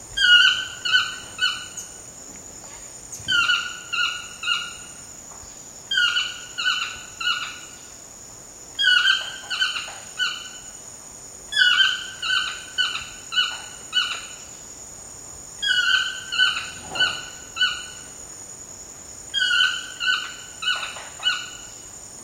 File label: chestnut mandibled toucan; costa rica 2013